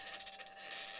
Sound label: mic, mars2020 edlcam